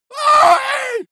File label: monster growl attack high; schreie